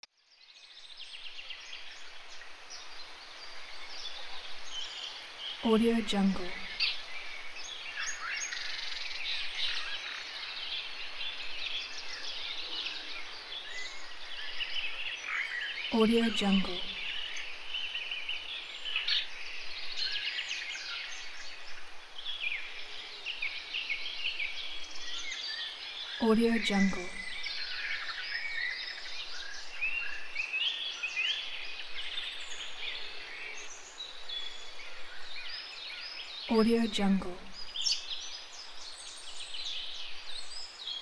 forest

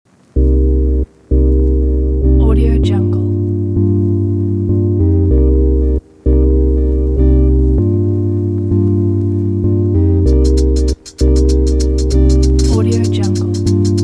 urban vlog

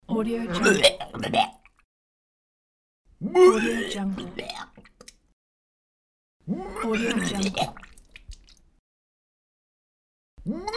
vomiting

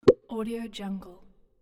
pop

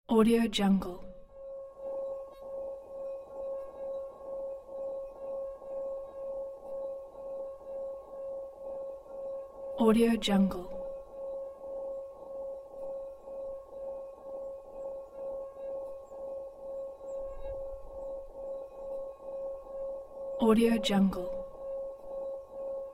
tibetan signing ball

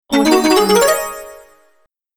martial art success

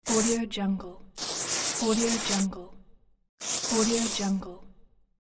spray; chardaq

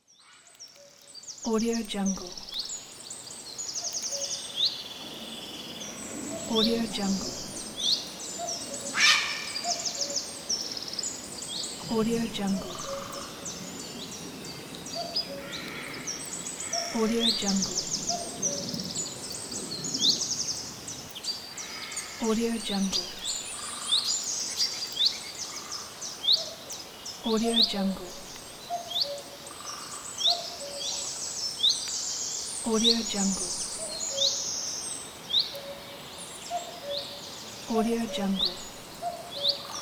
forest; lama sound

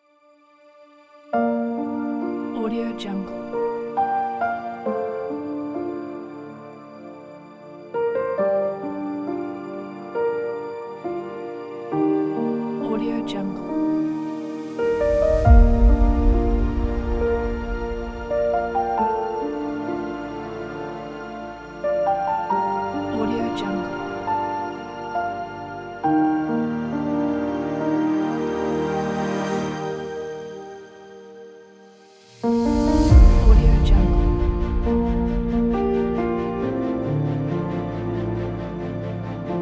dreams uplifting inspirational cinematic piano

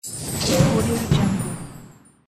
fail game lose level oriental

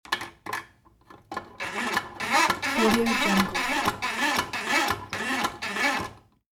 technology telephone old windup cranking

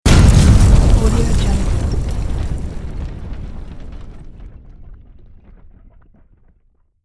falling rubble explosion

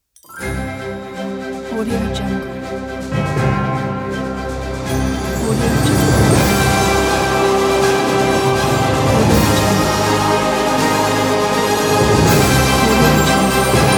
fanfare logo

